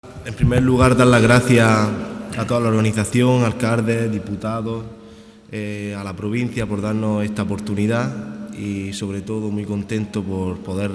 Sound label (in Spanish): flamenco